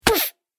Hit The Gremlin, monster; Horror Sound Effect

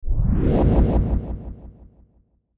Ascend Whoosh Delay; Blastwave

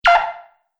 Multimedia Button; Button Sound Effects

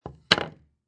Sports Ping Pong Table Tennis Paddle Set Down

